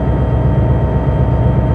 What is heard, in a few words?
Motor, Train; P42DC x2 11 Car Superliner Long Distance